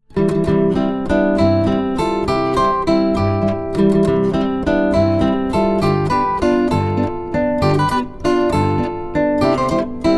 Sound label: zither